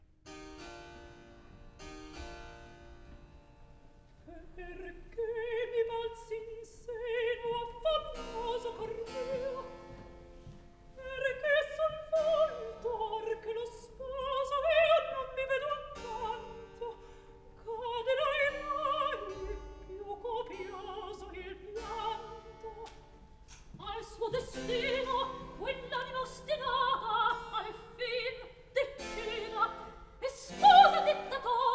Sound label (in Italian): Atto Secondo Recitativo; Giunia, Celia; Mozart; Operas; KV 135 Lucio Silla - 1772